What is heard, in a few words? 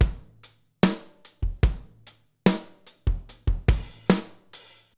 LOOP, Groove Activator; AMG Gota Yashiki